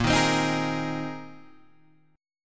Bb 7th Suspended 2nd Suspended 4th Bb down Guitar Open G